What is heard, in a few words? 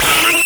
VOX SQUEK, Anime Sound FX; COMIC